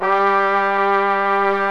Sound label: ORGAN & BRASS